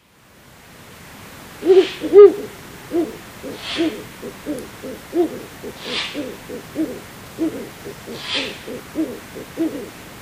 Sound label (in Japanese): fukuro, sukagawa, koe